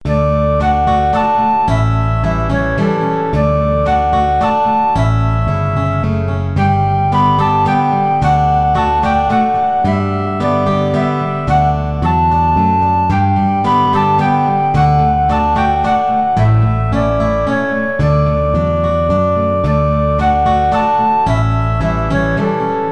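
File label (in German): Dat du min Leevsten büst, Gitarre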